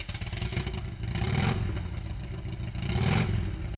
Harley

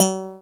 Short Analog, baesse

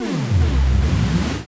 dance chord

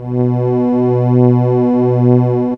Atmo; instrumente

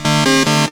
Five Octave Jump; instrumente

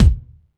bd room; percussion

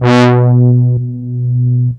Analog Brass; instrumente

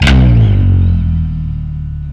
Slap Fall, baesse

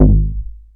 Poly Slap

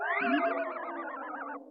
Horses, FX Effect Sounds

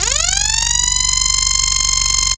Rise Alert

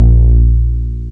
Soft E Bass

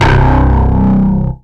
Dist. Techno Bass

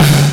Big Snare; percussion; SDs; SamplePool3

